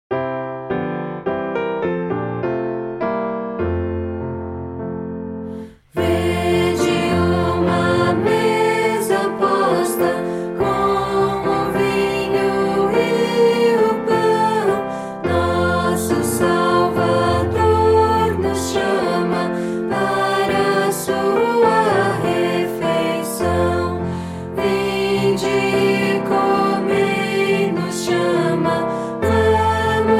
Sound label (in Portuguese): voz piano